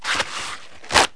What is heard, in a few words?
Remove Paper Towel; KABOOM!; Household; Kitchen